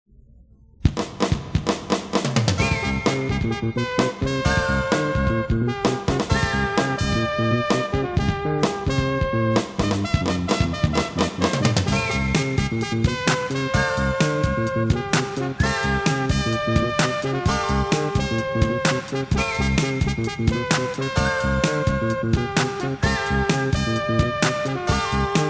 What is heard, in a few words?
80s Pop